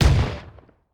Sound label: Shotgun